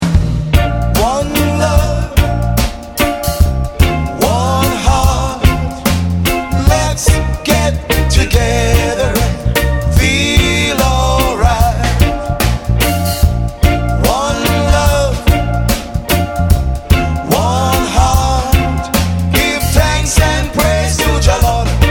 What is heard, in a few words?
reggae